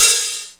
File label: HAT, Hip Hop